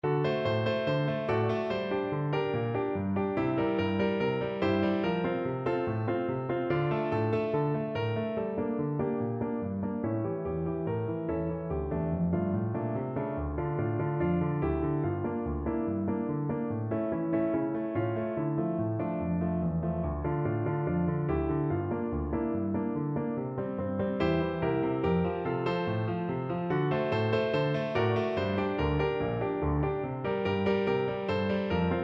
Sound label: oh mother piano